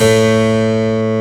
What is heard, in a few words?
HARPSICH